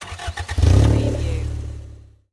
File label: Car Motor Start Up Engine Perspective